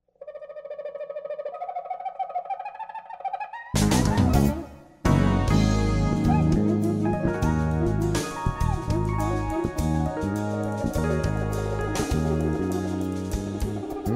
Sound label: Cuica